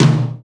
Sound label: TOM TOM, Real